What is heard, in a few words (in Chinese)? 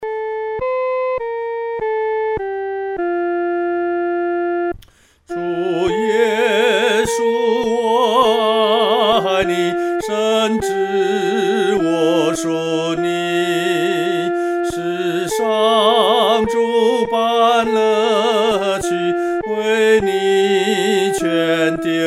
独唱 第一声